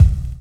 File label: Cracklin Kick; Drum Hits; Kicks; Loopmasters; Jon Carter & Alex Blanco; Block Party Essentials